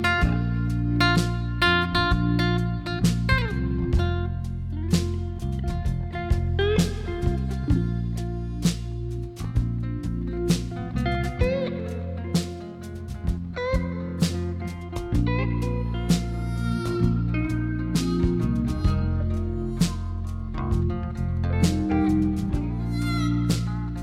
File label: christmas blues